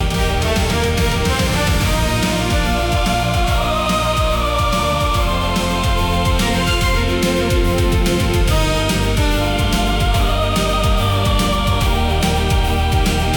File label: loop; opening